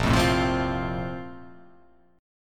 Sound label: A sharp Major 7th Suspended 2nd